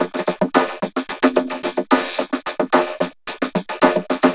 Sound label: Vocobeat; Sonic Foundry (Sony Creative Software)